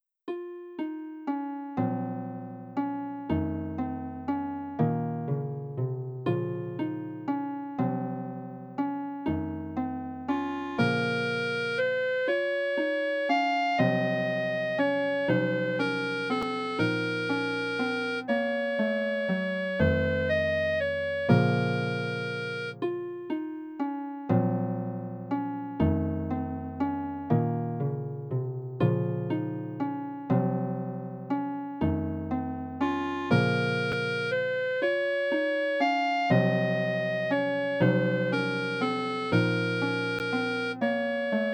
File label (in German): Keltisches Volkslied; hamilton